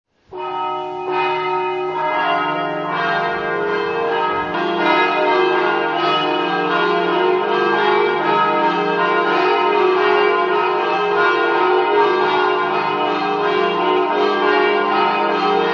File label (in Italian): campane